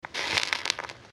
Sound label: Stamp